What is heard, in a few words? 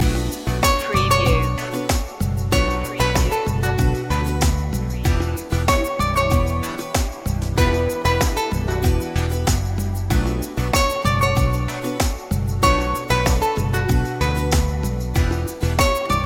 love rhythm loop